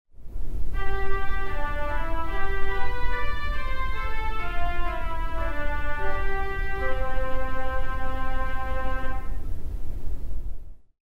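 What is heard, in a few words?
Strings Tremolo, theatre organ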